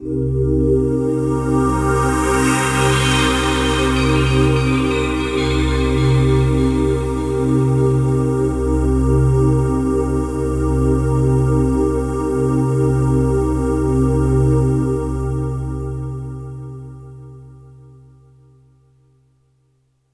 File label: MINL, Synths; JD-800; ICEMAN